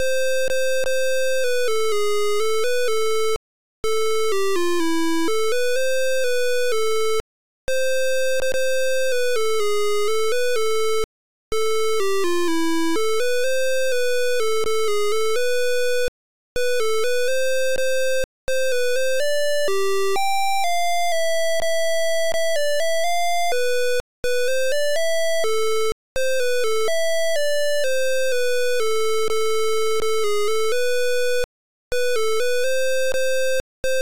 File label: MELODY